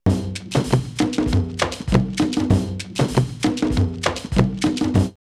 Bangui 98, drumloops World & Ethnic; X-Static Goldmine

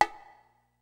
PHAT TRAX KIT, DRUMS; Kid Nepro; Elite

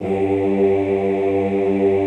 OOH G1, Classical Choir; Best Service; Extended Classical Choir; OOH MIX

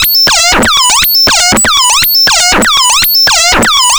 Random, LFO & Noise

